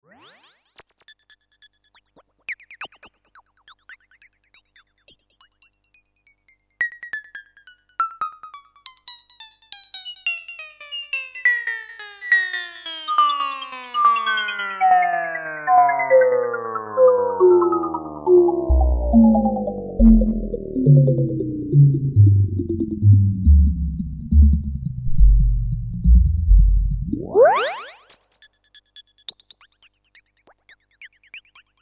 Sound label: Sine Sweep; RS370; Same patch as above, RS370 in analogue realtime mode, an RS510e trapezoid generator slowly; sweeps the RS370 single note.